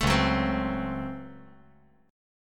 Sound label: C sharp Major 7th Suspended 4th Sharp 5th, C sharp